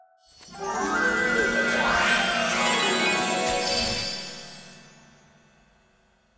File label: fairy Nudge; netent